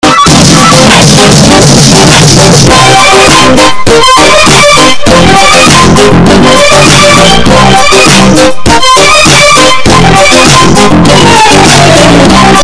walking earrape; wide putin

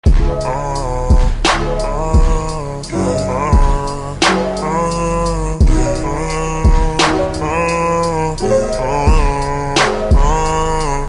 ugh ughh

